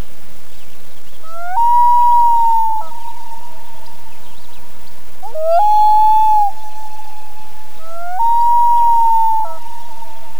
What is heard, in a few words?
Loon sounds wail